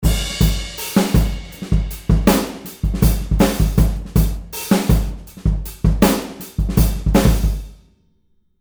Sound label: Dry Kit